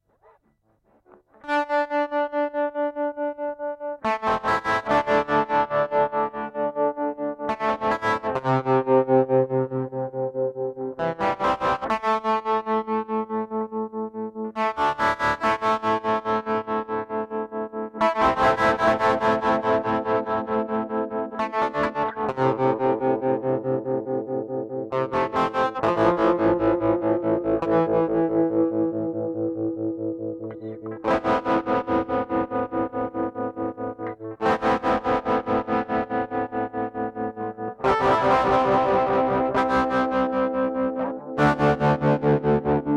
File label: nexi overdrive tremolo